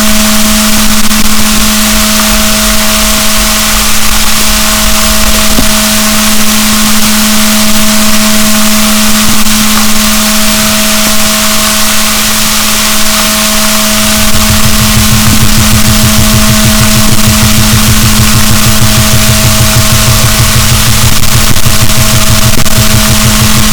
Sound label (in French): rorquals positif, BalaenopteraPhysalus, boat; SNR 6dB